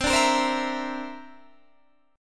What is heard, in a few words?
D 7th Suspended 2nd Sharp 5th C